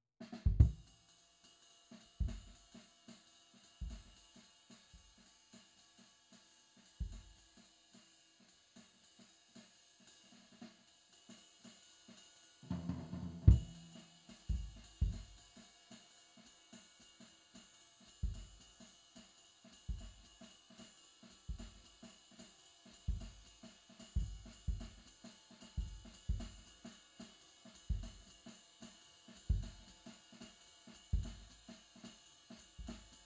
Kick IN

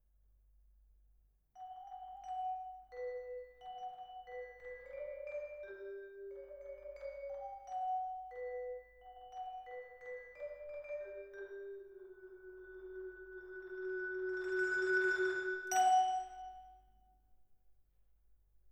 Vibra

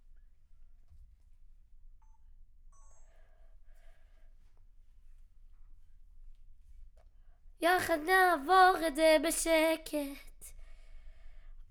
Children Choir; Studio Bekol Children Choir

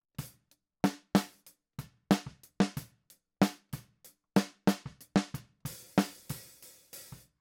Snare

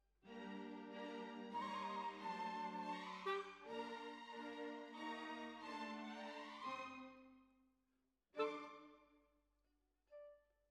Bassoon, Jazz for piano

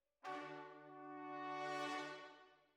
Trombones, jazz for piano

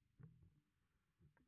Bass Sansamp

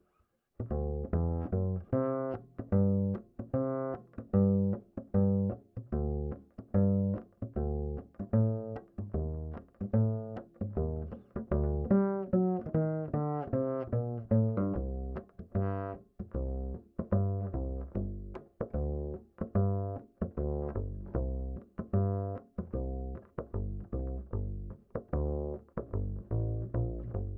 Bass Line, Ragtime

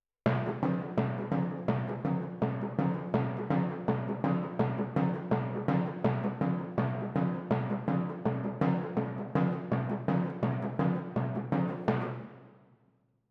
Twin F Timp

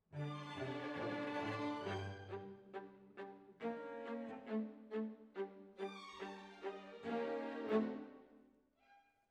Violas, jazz for piano

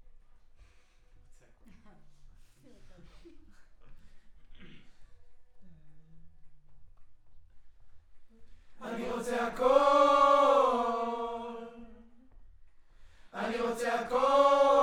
Choir

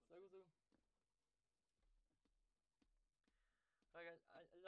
Sax

